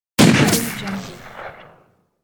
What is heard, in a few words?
ricochet gun shot